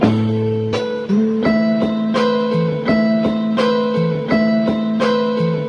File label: guitar, Jazzmatazz loops